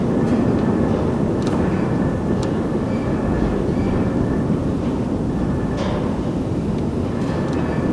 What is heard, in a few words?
street wind loop, ambience; Left 4 Dead 2